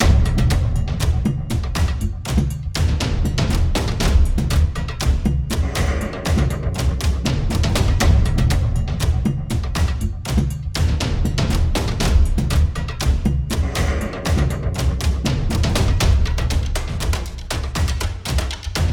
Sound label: Tutorial Battle Loop Perc 120; skykeepers; ps4 windows xbox one gamerip 2017